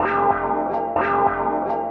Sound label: house synth 125 A, keys